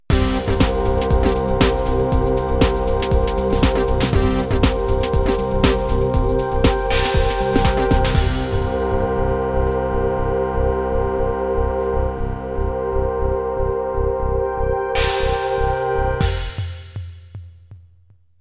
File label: Progressive End